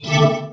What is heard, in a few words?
Menu Magic